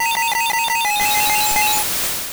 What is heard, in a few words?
Psychic, Attacks; P3D-Legacy; Battle; Ice Punch